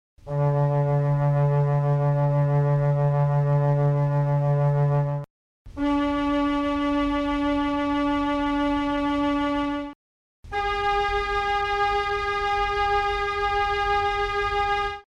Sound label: flute string organ stop